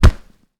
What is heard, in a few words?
Hard Punch